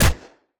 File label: Action Gun Shot